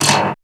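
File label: METAL, FX percussion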